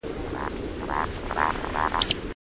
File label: scolopax rusticola